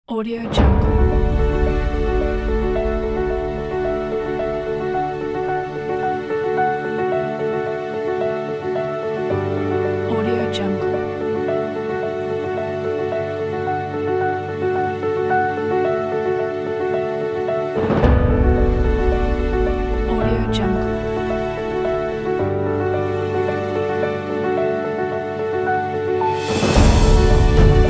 inspirational epic cinematic

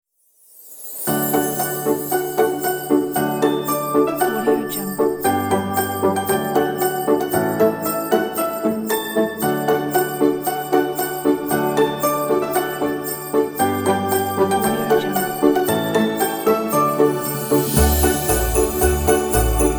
jingle bells

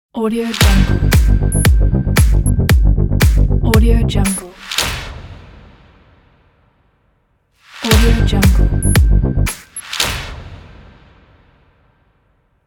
technology music logo

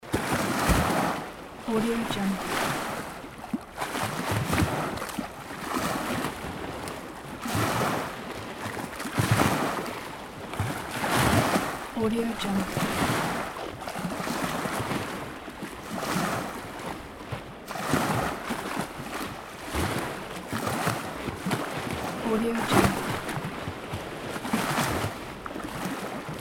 waves

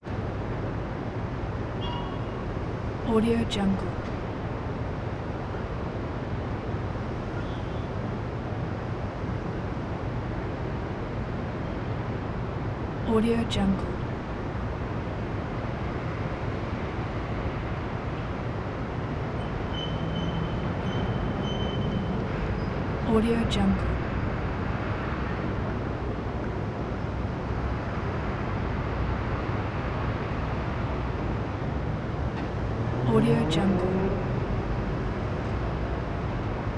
san francisco downtown distant traffic hum

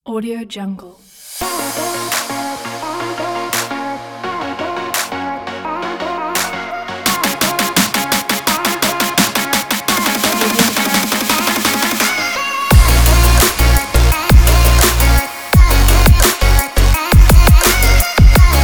future bass

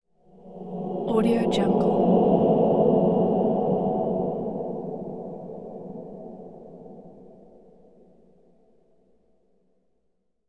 distant monks singing whoosh

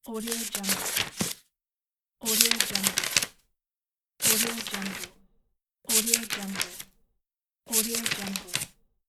flipping pages

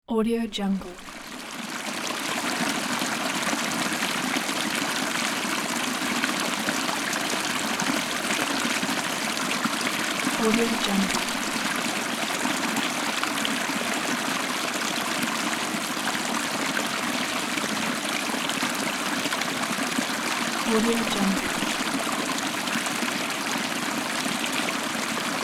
stream in cave

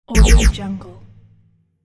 laser

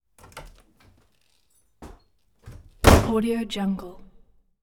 half glass door

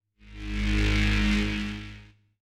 pulsing tone buzzy mid range synth tone

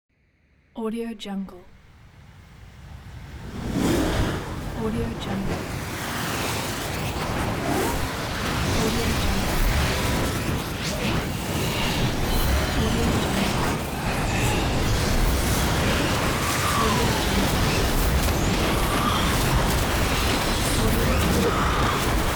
tornado

